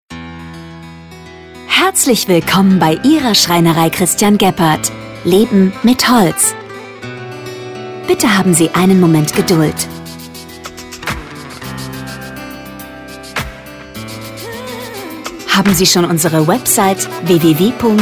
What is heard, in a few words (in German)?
Warteschleife; telefonansage handwerk